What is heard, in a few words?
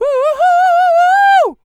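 falsetto, R&B Dance; Spectrasonics Vocal Planet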